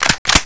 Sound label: 870 pump, weapons